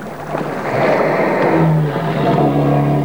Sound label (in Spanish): ronquido